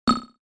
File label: select Menu; spacemap